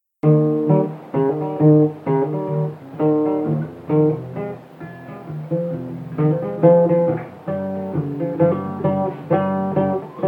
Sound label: instrumental